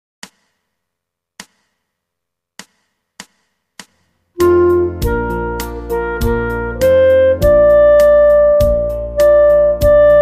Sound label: Worried Man Blues